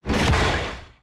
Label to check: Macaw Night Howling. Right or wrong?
Wrong